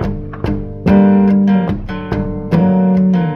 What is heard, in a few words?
guitar, Sad